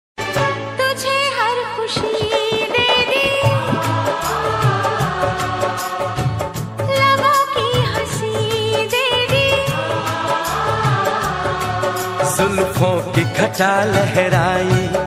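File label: sad song female version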